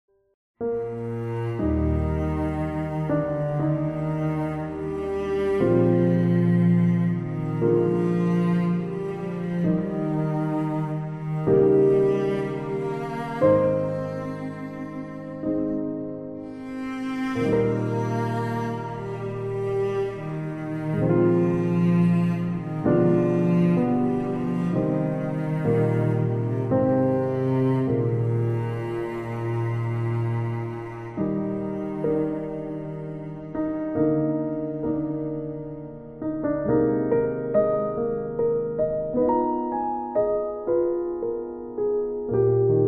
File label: heavenly christmas music instrumental christmas songs cello piano; colinde; CANTARI